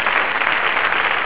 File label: applause; pigs